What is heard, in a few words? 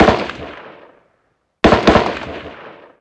gun m16 med